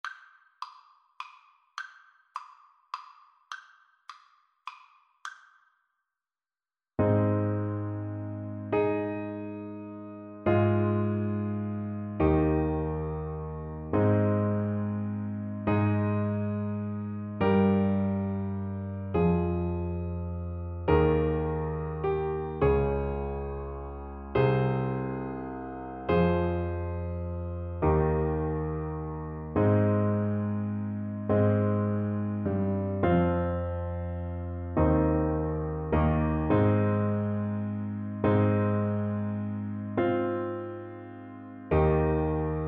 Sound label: Clarinet